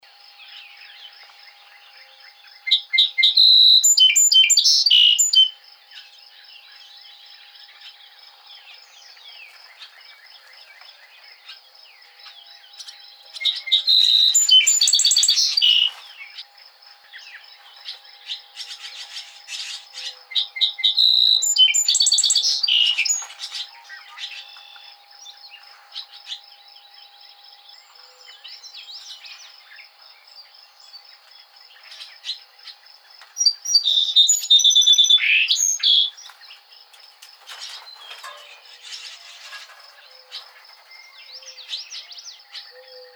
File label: Song Sparrow